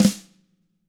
TAMA SNRD, TAM FLM; ILIO; Double Platinum Drums 1

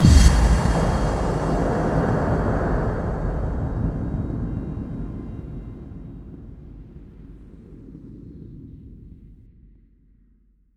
FX Kicks

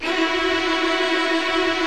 VIO MAJ TRIL

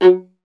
viola F; Miroslav Vitous; Solo Instruments VOL-1

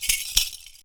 perc fill; Univers Sons - Raricussions

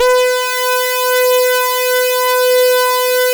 SAW RES DRY, SYNTH

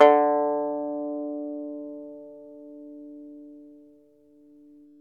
ARCHTOP, Arch Top Banjo

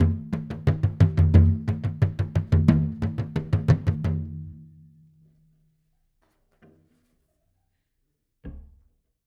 Bodhran, Celtic; Sonic Foundry (Sony Creative Software); Continental Drift Aftershocks

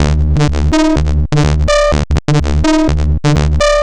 Jackin Bass; Sonic Foundry (Sony Creative Software); Underground UK House & Electro

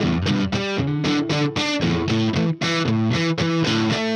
Riff Guitar, Indie Rock; Sonic Foundry (Sony Creative Software); Indie Rock Anthems; Session 06 - Haze

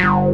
TRANS X BS; Pro-Rec - Synthsonic; TRINITY

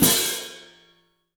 CYFX, EFFECT CYMB; 3D Sound; 3D Drums

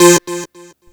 acid synth (A); Sonic Foundry (Sony Creative Software); Ugly Remnants VOL-2

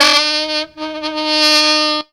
2 STEP, FUNKY SAX; Zero-G; Funky Elements

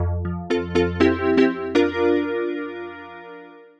DX HARP; East West; Twisted Textures-BT

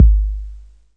KICK, DRUMS; Kid Nepro; Elite

